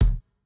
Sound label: Jrock kick